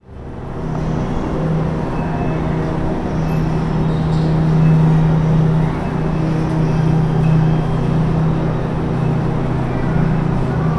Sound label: drone